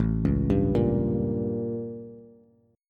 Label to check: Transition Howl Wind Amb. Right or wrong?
Wrong